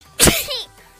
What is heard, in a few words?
sneeze